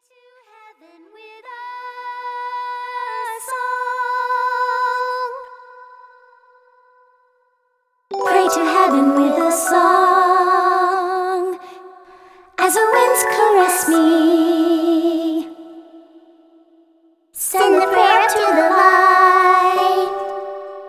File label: Music Box with Vocals; Grimoire Song; Rune Factory Frontier